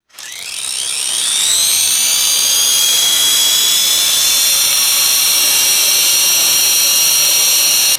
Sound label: high pitched electric drill motor running; tools